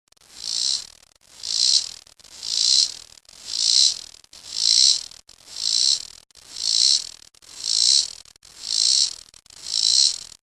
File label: cicada, T robinsoniana